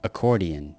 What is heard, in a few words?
accordion